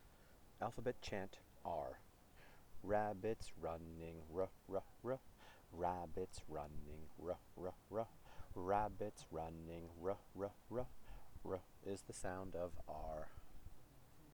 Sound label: alphabet chant 'r' fast